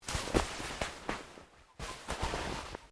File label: Clothing Shirt Cotton Move Quiet; Movemement sounds for various articles of clothing; Dressing